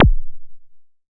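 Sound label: kick, ARP 2600 Drums; Polyend; Simulacrum Sounds; 1 Shots; Synths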